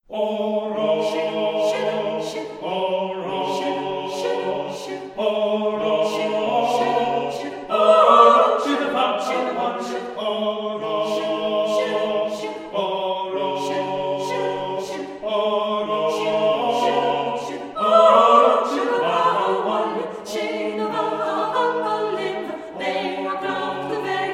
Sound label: Oro se do Bheatha Bhaile SATB